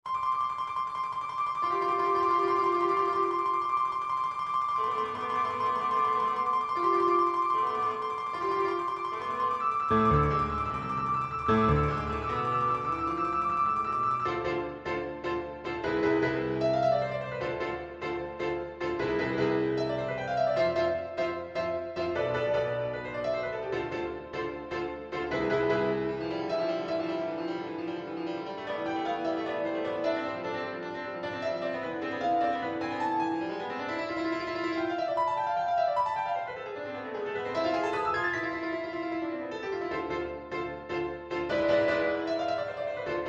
Like the bird, a fast and playful piece with a slow and melancholy m; Hummingbird; Like the bird, a fast and playful piece with a slow and melancholy middle section.